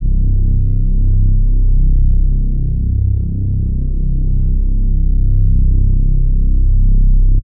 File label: Reese Whale (C); One Shots; Cymatics; DOPE DRUMS VOL. 1